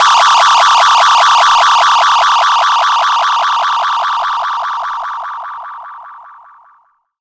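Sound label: SYN right, Production Effects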